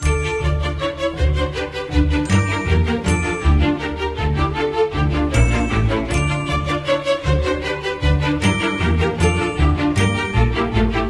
Christmas Dinner Background Music; Pixel Gun 3D

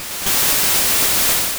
Icy Wind; sfx; attack moves; pokemon; gen 2; gsc

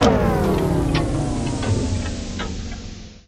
Clock; Peace, Death; Clock Chronos

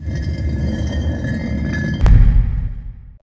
effect trapdoor; lucius

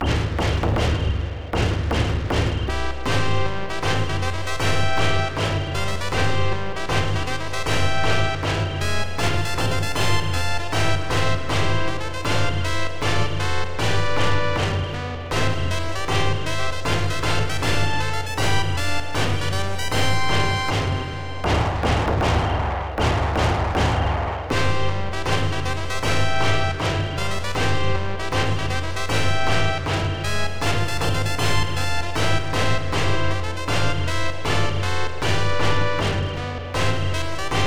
Hitting March

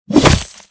Axe Two-Handed Hit

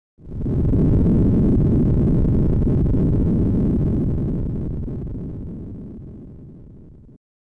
Opening Vibration Sound

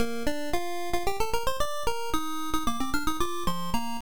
Checkpoint; super cobra; msx; psg

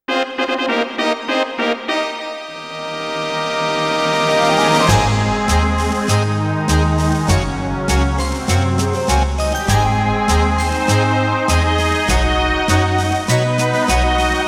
Unexpected Fanfare; Atelier Viorate Original Soundtrack

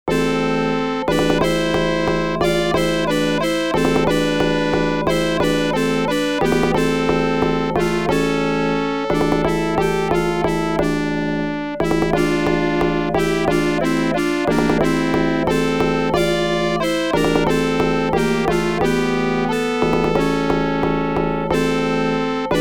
Death; koei original bgm collection volume 1

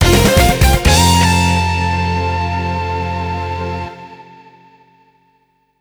Goal; F355 Challenge Passione Rossa; Dreamcast

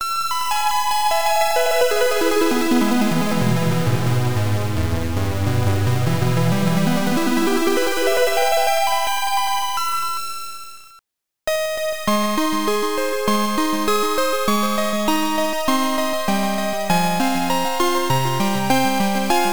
Harp; Kaze no Densetsu Xanadu; The Legend of Xanadu; pc engine cd; Harp~HEARER

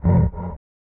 Monster Breath

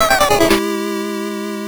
Death; Master of Darkness; Master System

